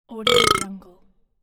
burp

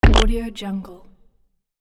little ooze hit

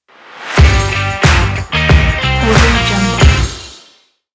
rocking logo

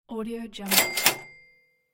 cash register

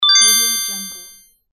gained

